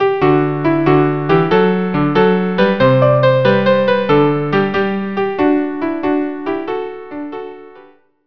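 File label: violin viola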